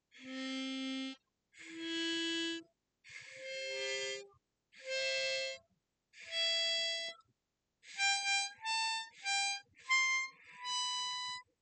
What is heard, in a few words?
Notes, harmonica